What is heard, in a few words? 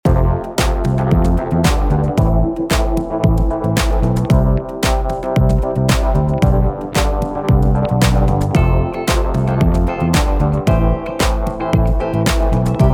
113bpm, beats